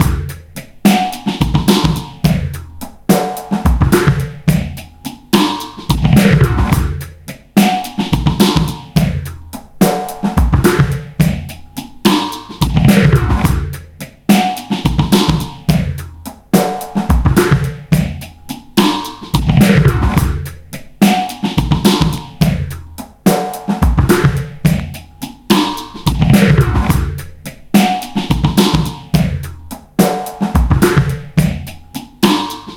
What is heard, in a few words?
107 bpm; FAZED ACTION; 90 s Sample CDs; Planet of the Breaks